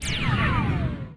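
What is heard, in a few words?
enemy shot miss; Alawar; Star Defender 4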